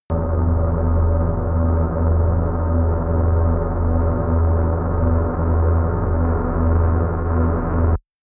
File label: spaceship engine far; raumschiff